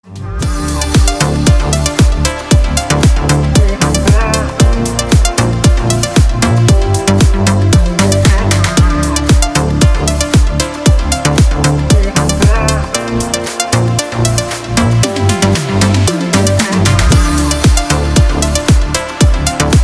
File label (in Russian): Deep House рингтон; Deep House рингтон для мобилки